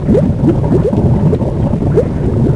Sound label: Sub Oil Spill; Finding Nemo; XBOX